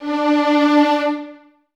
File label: Violins Detache D 4; Miroslav Vitous; String Ensembles